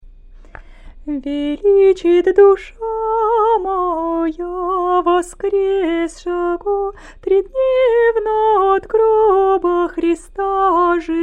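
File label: easter canon byzantine hirmos faster, singing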